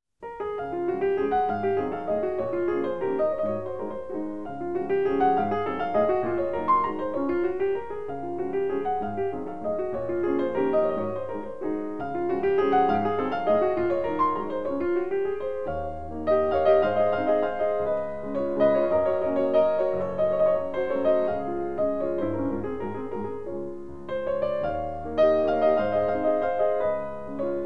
Valse No.13, Op.70 No.3 in D flat major, Chopin Piano; Samson Francois; Chopin Piano Works